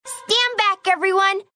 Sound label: Player PreSpecial Bubbles; The Powerpuff Girls - Mojo Mayhem; NextlevelGames